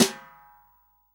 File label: SD, REAL DRUMS, SWING KIT